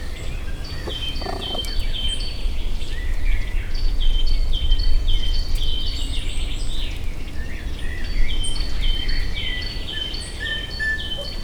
és a környezete, mosómedve; pecsi zoo 2017

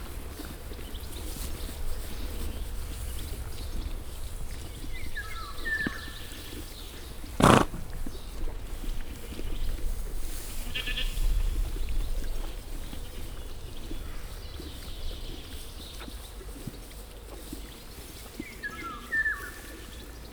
pruszkolo allat, ponilo; felsolajos zoo 2015; cardioid

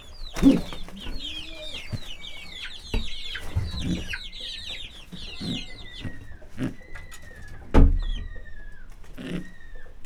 csirke ólban; parasztudvar

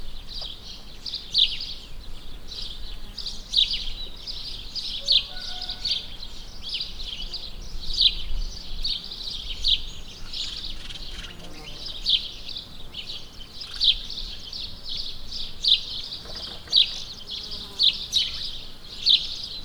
június közepe szőlős árdó, házi veréb

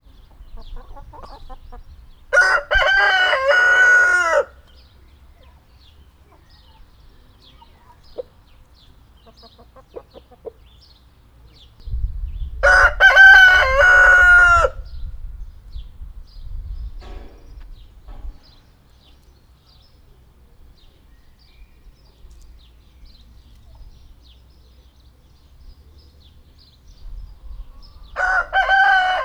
kakas háromszor; nyiregyhazi zoo 2010

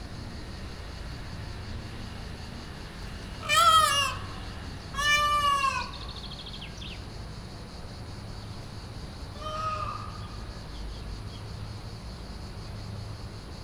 kozelrol es tavolrol is, pava; makryammos beach; thassos

